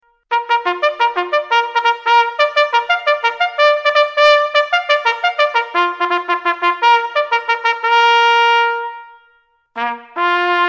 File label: military wake up song ringtone; Alarma Militara; ton de apel